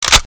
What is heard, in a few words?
bolt slap, weapons